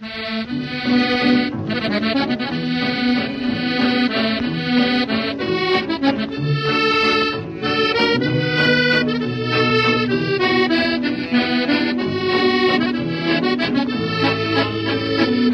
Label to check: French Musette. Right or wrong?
Right